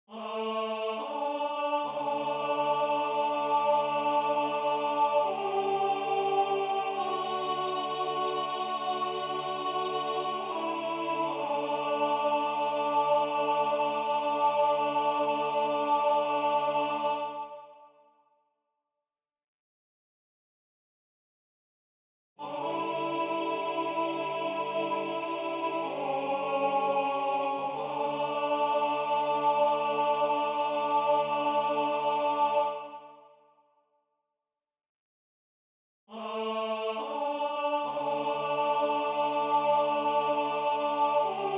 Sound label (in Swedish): SOPRAN ALT